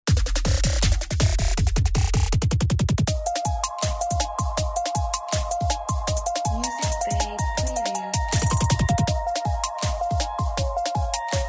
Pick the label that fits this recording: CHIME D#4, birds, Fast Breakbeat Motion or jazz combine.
Fast Breakbeat Motion